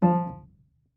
marimba piano; ゆめ2っき

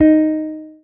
marimba guitar; ゆめ2っき

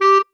marimba penguin; ゆめ2っき

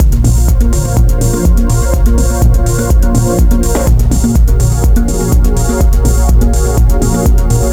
dub; ゆめ2っき

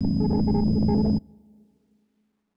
low drone; ゆめ2っき

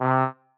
marimba trombone; ゆめ2っき

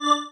marimba spacesuit; ゆめ2っき

